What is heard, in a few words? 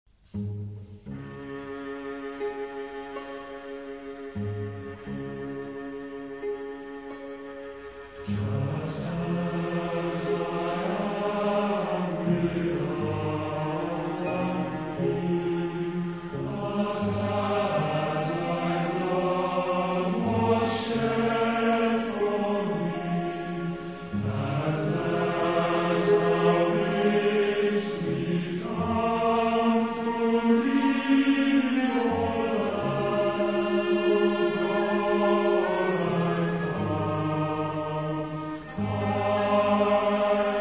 Orchestra and Choir